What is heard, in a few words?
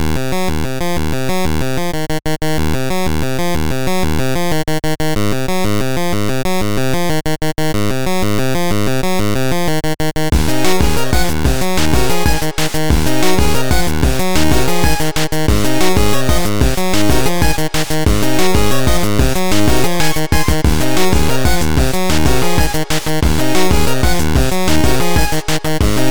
Airship Loop